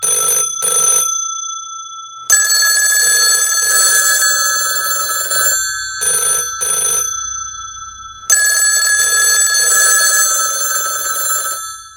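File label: Ringtone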